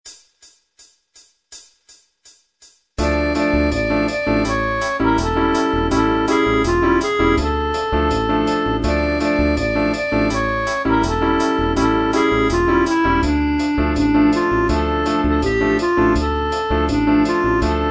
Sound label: Cafe Oriental